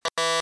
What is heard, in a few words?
door buzz